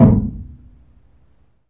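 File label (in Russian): короткий одиночный глухой стук в металлическую дверь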